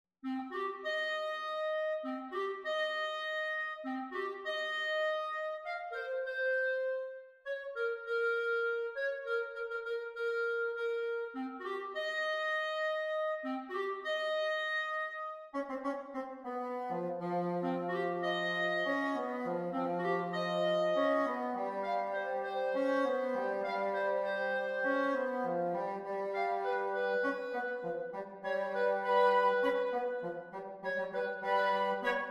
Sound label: Wind quintet